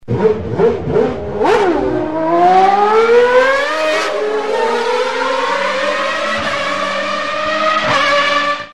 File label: Ferrari